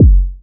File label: boomy steel kick drum sound f sharp key